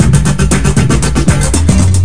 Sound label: Atmos loop